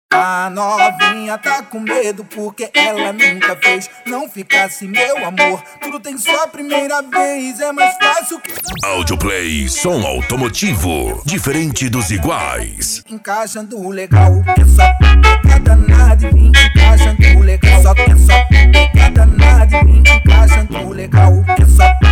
esp funk mega bass

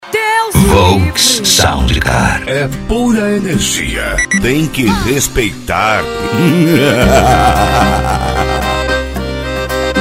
sertanejo e pisadinha; cd volks sound car

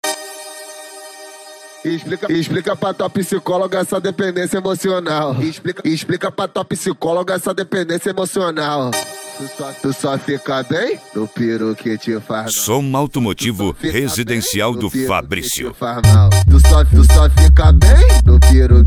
som automotivo funk bass

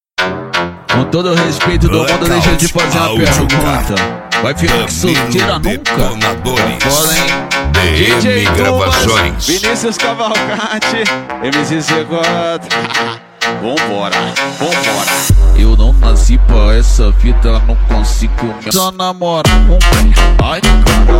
eletro funk

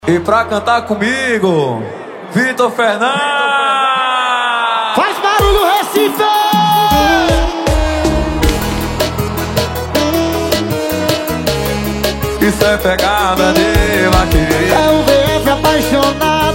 sertanejo

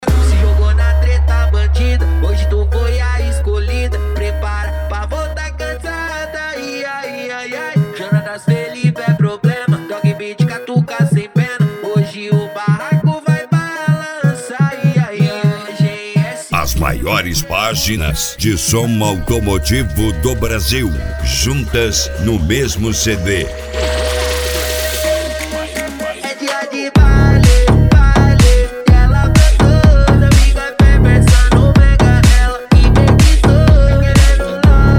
som automotivo; rebaixados

